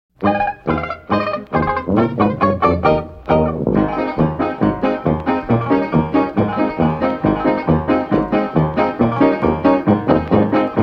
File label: reel, instrumental